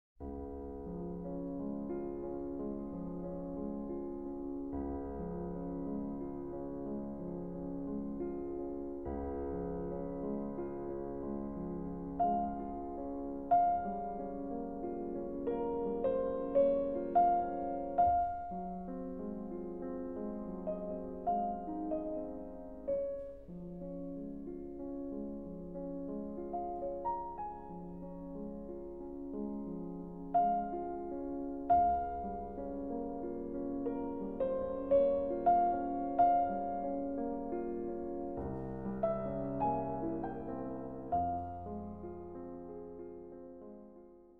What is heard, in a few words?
Lento placido in D-Flat Major; Consolations, S. 172 III.